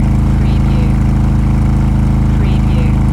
Motorcycle Harley Davidson Loop RPM 4000 Exhaust Perspective